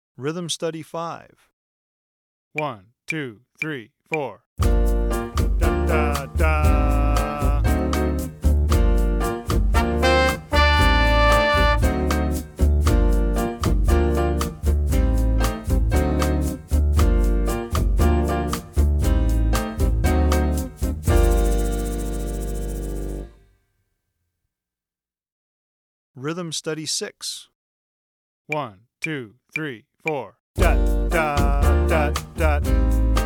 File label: bossa rhythm studies